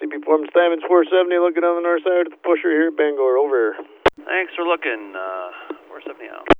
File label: Roll By; BNSF 9748 Bangor